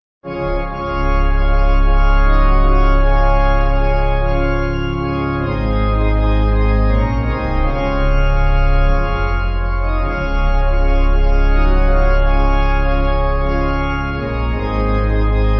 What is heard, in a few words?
Pipe